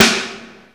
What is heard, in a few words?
snare, Emu Sp12; Drums